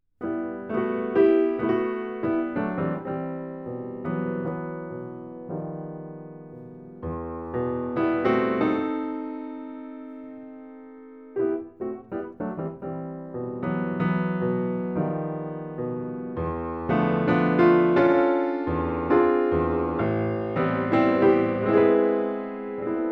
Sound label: Classical